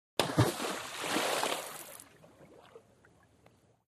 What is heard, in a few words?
Splash Boulder Rocks